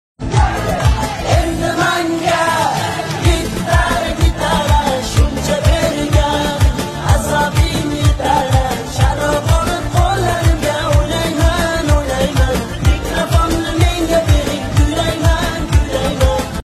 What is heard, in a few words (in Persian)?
Gitar bezan